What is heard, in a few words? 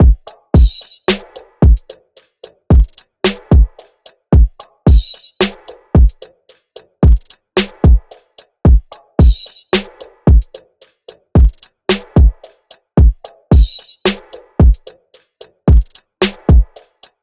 Drum Loop, 111 BPM, RnB; Forever